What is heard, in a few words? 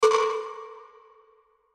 Percussion Hit, Percussion Hit Woodblock Cowbell; Hits; Musical; Blastwave